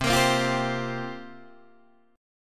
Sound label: C 7th Suspended 4th, Guitar, DADGAD